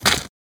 run gravel

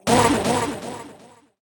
death; boardwalk

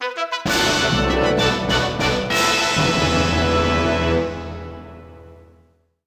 Stage Clear; super smash bros. ultimate

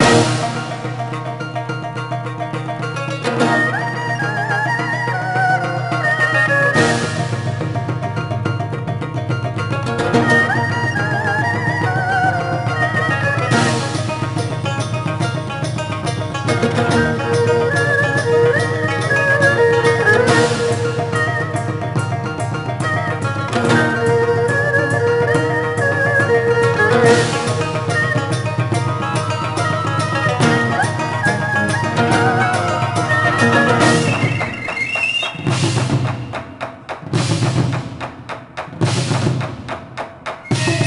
Combat; BGM Level Gongmen City

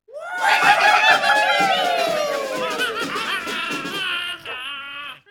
Level Clear Military; angry birds rio

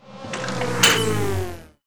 Door Unlock

